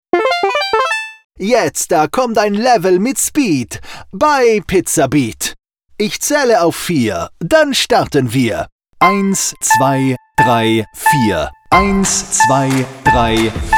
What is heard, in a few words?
Beats 80-120 BPM Deutsch; PizzaBeats Level PM